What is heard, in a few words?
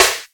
present snare f sharp key